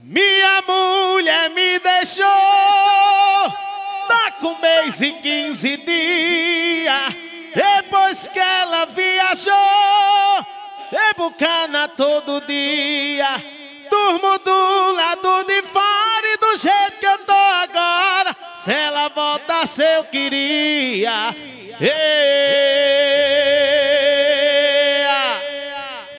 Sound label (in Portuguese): toada